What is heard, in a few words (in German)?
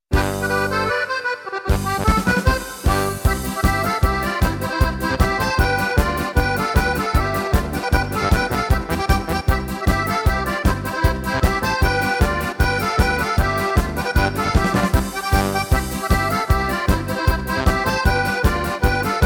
volkstuemlich hey mann polka